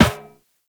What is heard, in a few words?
Operator Run Metal Perforated, Footsteps; Warframe